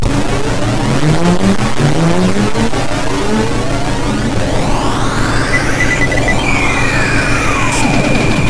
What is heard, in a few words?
breakdown